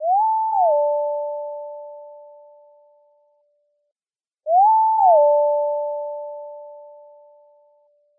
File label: ghost ringtone